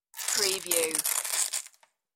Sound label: Velcro